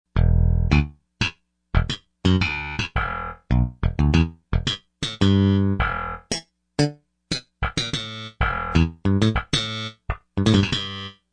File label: Thumb Bass, Yamaha TG55; Factory Presets